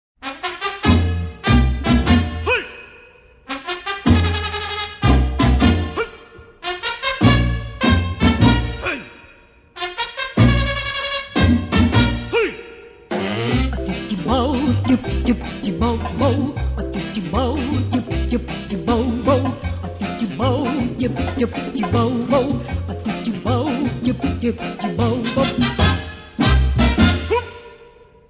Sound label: mambo